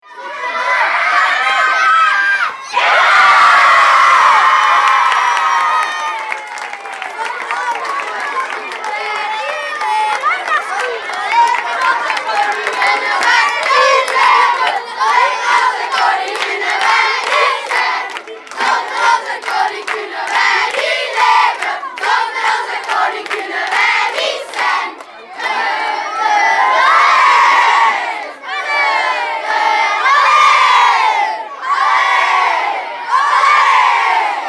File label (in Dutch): sfeer zandvliet